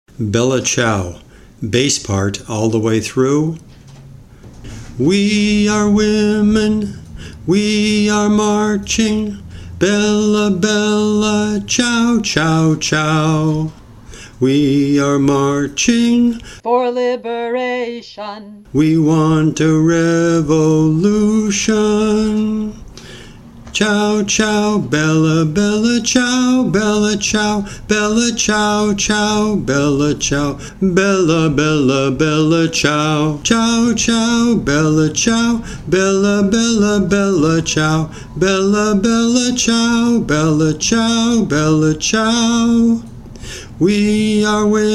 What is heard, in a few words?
Bass I; all the way through; in English; Bella Ciao